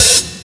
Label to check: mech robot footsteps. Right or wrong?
Wrong